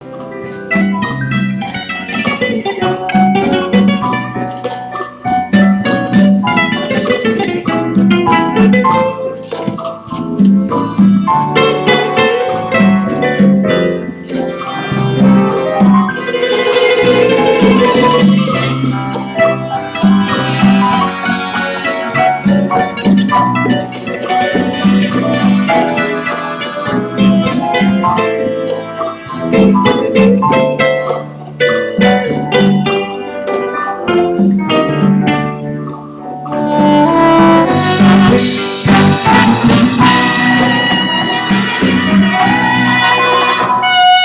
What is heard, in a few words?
Guitar